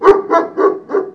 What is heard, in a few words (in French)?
chien, animaux nature